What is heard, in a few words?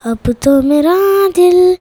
CHILD VX C, Indian Traditions